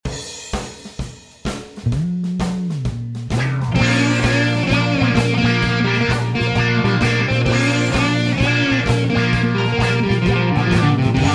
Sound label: guitar